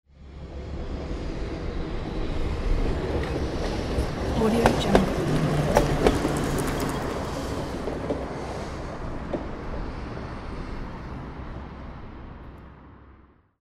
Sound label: traffic tram